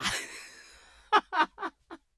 Woman Laughing

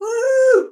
Voice Whoo Hoo

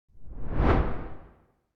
Deep Whoosh Speed Ramp

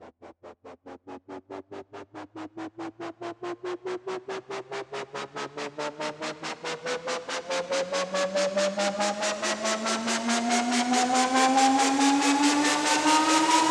Riser, 140

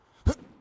Jumps Start

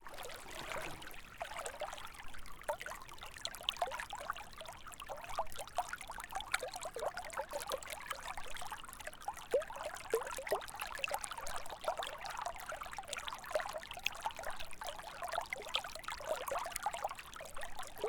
River Little Sounds

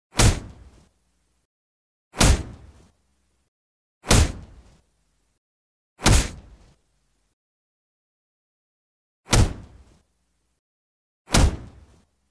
Heavy Intense Punches Or Kicking Sounds

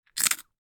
Chewing Breadstick Single

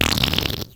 Diarrhea

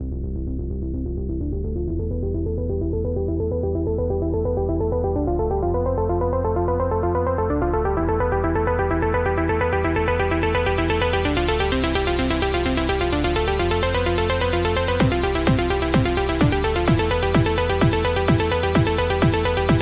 Edm Intro

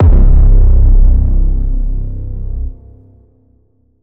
Braaam Deep Waters C

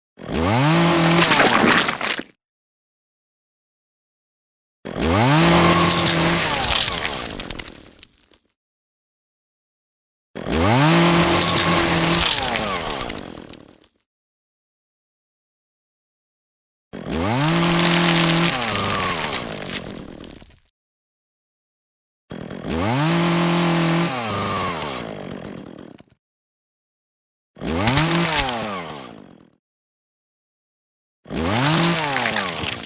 Chainsaw Cutting Through Flesh

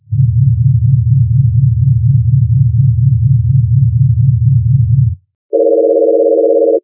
Deep Pulsating Hum 8k

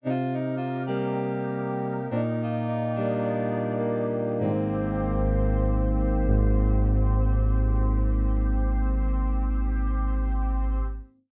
Dark Intro

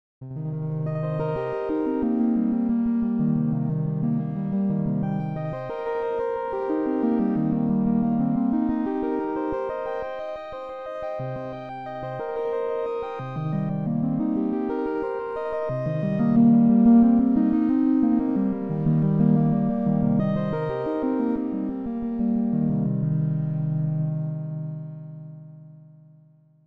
synth loop

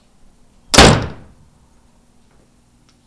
Door Slam

